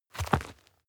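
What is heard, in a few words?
step dirt heavy boots hard, footsteps; schritte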